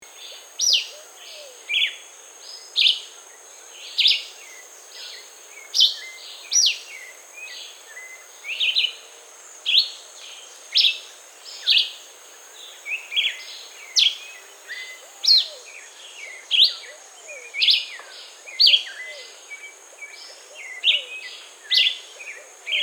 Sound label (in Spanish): Vireo verdiamarillo Vireo flavoviridis